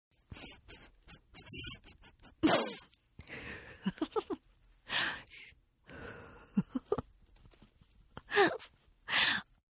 Giggle Female, Laughter; Voice

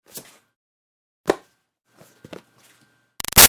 Foley Frisbee Plastic Throw-Catch; Small Move; BigRoomSound

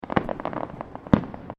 Fireworks Small Display Crackles; Backgrounds

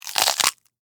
Crunch Celery; Texture; Crunches; Objects; BlastWave

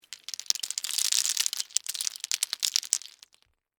Debris Mixed Nuts, Pile

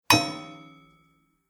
Clang; Clang Sound Effects; Impact

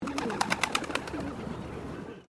Bird Pigeons Single Takeoff, Australian birds; Animals

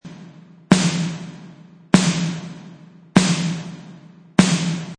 Snare1 Loop 98bpm, Snare loop at 98bpm; Drum Loops

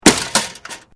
Metal Light Movement Minor; Materials

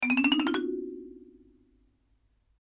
Xylophone Ascend; Long, Musical; Mallet Sound Effects; Cartoon

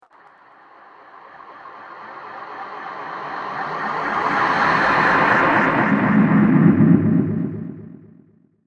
Swallowed By The Spirit, ghost sound; horror; Andrew Fleming

